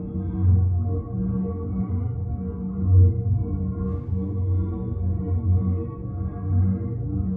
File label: Sfx Ui Contracts Loop; linux macos windows gamerip 2019; Griftlands